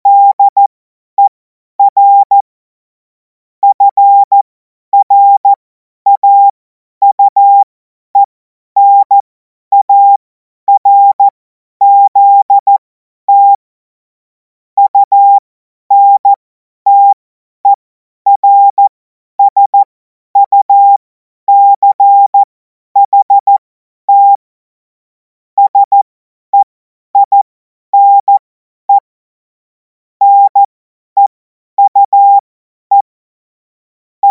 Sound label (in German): Witz, cw morsen; Morsekurs